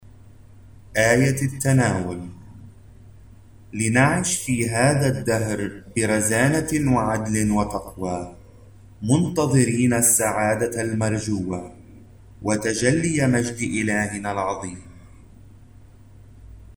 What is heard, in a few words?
Communion Antiphon; Thursday of Advent